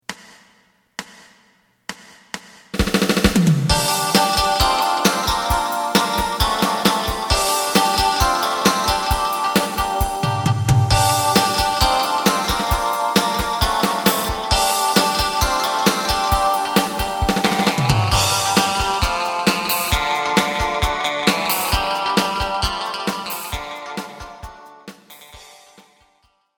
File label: bass